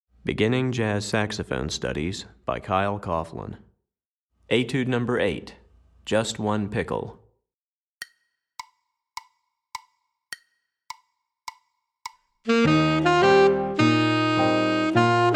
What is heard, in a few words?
Alto Etude, Saxophone; Beginning Jazz Saxophone Studies Alto V1